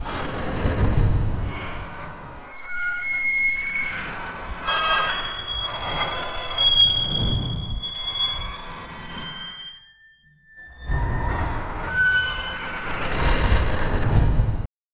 a rusty iron dungeon gate; architecture

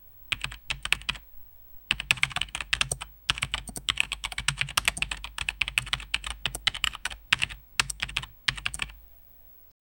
calm smooth computer keyb

